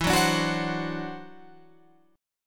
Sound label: E 7th Suspended 2nd Sharp 5th, E, down, Guitar, Open F; E7sus2#5; strummed